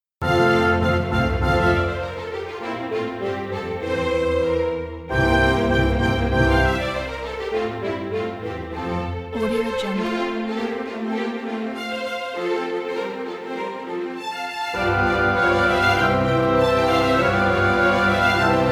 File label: beethoven sonatina